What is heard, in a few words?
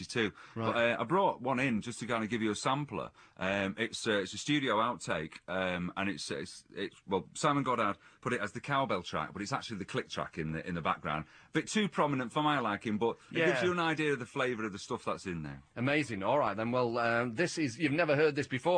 Cowbell Click Track